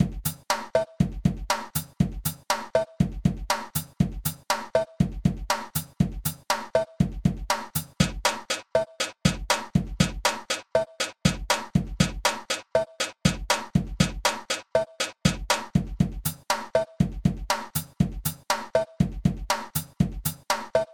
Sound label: drum example; Hydrogen; open source drum sequencer for Linux